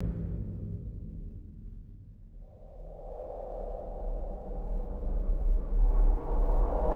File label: low reverberant cinematic pulse drone; swooshes